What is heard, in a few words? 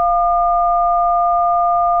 DTMF 1 Noisy